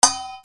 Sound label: BELLS